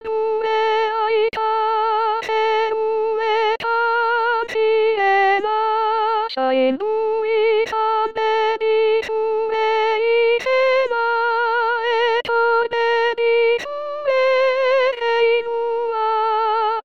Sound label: Soprano